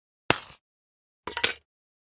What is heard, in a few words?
Golf Ball Into Cup CU